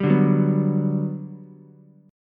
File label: G Major 7th, Ukulele; GM7 strummed